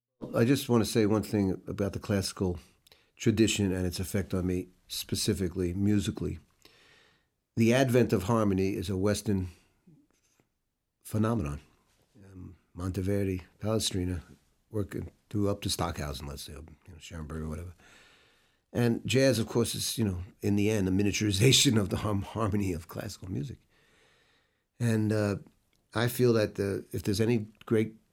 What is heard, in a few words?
harmony